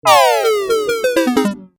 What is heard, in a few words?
Game App Lose Life, Video Game Sound Effects